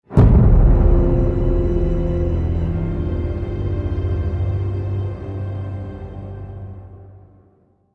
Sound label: Tense Stinger